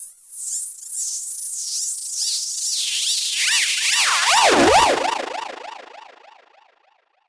Filter Proc, alarm siren, 100bpm; musicradar

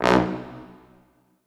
Orchestral Brass

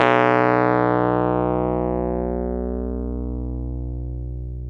Wurly, Keyboards of The 60's and 70's

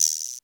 CR78 guiro; 90 sSampleCDs; Crypton - DrumBase5000

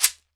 SHEKERE, Drums&Percussion

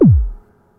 Moog BD; Moog Modular55

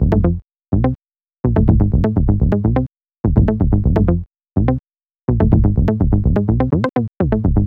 Bass Line; Eclectic Electronica

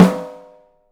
OHSTSN R, 3D Drums

